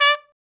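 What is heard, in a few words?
BRA TRUMP, trumpet, brass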